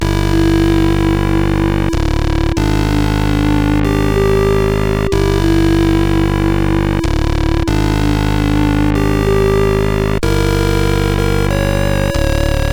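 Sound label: MOS 8580 SID chip music, games; CSG8580R5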